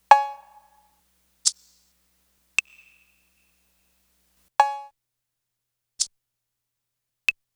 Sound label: Perc